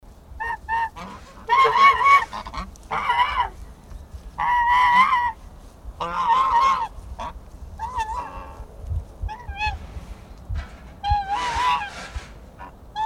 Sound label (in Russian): gusi